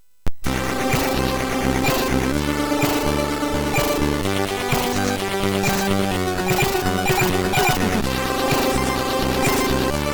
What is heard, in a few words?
Drums intro, sid, MOS6581R3